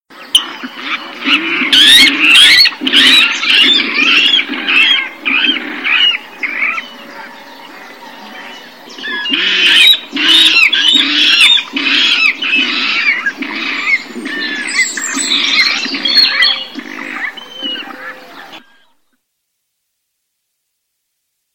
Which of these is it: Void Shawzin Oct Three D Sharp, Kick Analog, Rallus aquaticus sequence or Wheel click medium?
Rallus aquaticus sequence